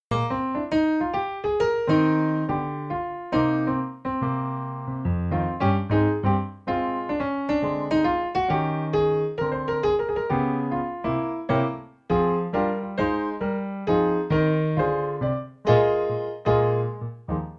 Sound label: Swing